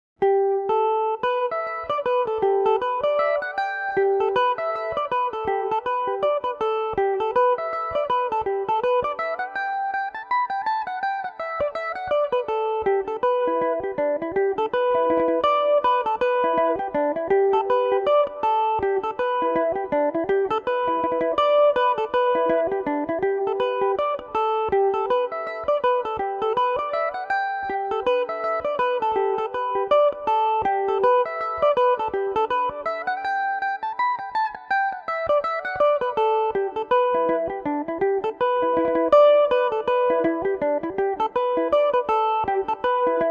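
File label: A dorian, irish mandolin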